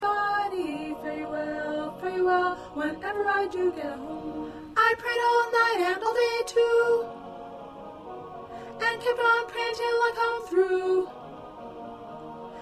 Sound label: Soprano 2